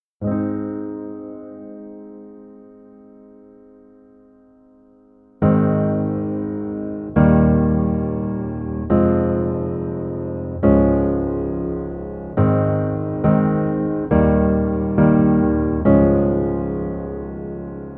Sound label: Piano ver